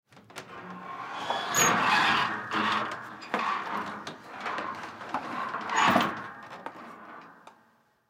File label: Attic Door Pull Down Open; Household; Miscellaneous Doors; Blastwave